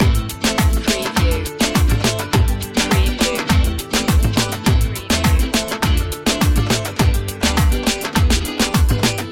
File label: global flamenco loop